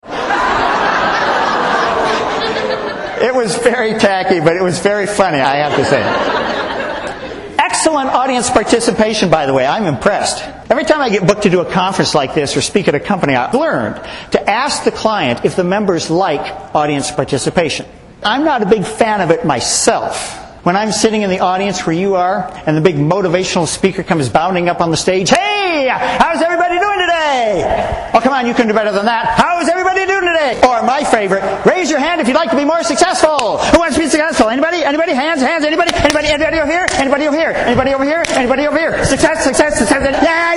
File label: New Audience Participation